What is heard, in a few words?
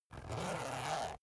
zipper use